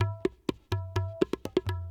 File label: PERC LOOP ETHNO 125